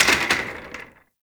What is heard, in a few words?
Office Chair Impact